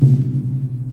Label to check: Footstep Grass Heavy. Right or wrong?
Wrong